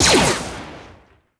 snipe bad fire; weapons

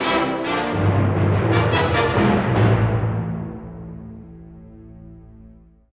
battle lose, music

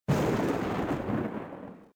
thunder; Soldier Of Fortune II Double Helix; XBOX; ambience

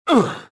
pain; Star Wars Jedi Knight II Jedi Outcast; XBOX; bespincop

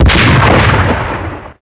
shoot sniper; weapons; Max Payne

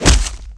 hit body, skater; Tony Hawk's Project 8; XBOX

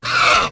die, Dino

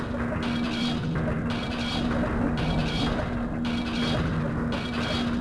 machinery, tracks

